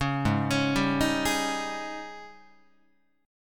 Ab Major 7th Suspended 4th Ab down Guitar 4A 4E 5A 5D 5G sharp 6C sharp; arpeggiated; JGuitar